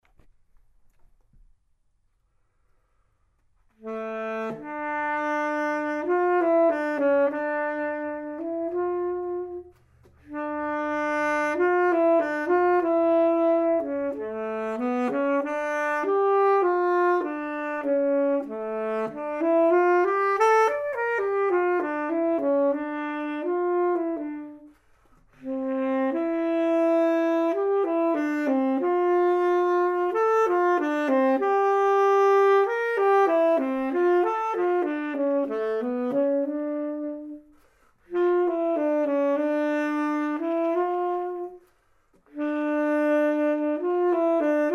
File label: t sax d minor